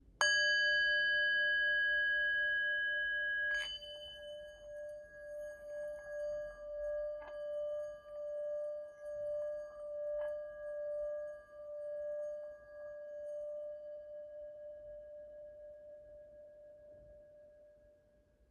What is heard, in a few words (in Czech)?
tibetska misa